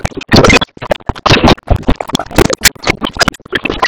Ambience Thunder; Cinematics; Warsongs; Warsong Prologue

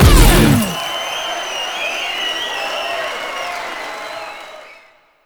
On Beat Solo; Warframe; Temple

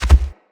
Gallop Default, Animals, Foley, Steps; Bromius

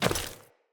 default step, steps; Characters; Ambient; Sprout Farmers

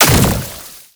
Brood Projectile Impact; Warframe; Oraxia

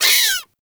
Forest Rodent Vocal Pain; Vocals; Warframe; Characters

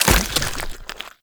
Step Sludge, Footsteps, Walk; Warframe; Characters; Tenno

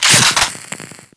Pistol Clip Eject; Warframe; Grineer; Grineer Akimbo Pistol

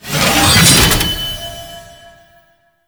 Shield Start Charge; Vehicles; Abilities; Thano Mech; Warframe; Nechro Mech

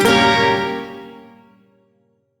Shawzin Phrygian Chord H; Warframe; Duviri

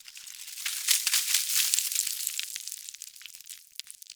Ice Slide Small; Warframe; Venus Open World; Venus Landscape; Ambience

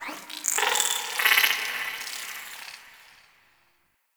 Sentient Lair Exterior Creature; Warframe

